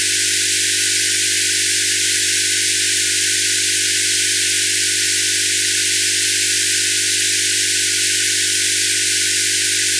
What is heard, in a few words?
noisy msg